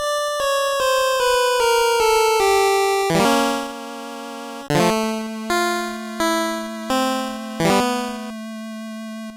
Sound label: 8Bit Game Over